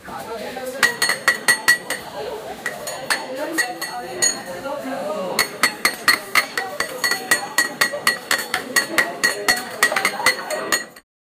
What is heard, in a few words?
restaurant clap yay; humans